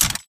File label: Mvmt FS Walk; AI Tick; Titanfall 2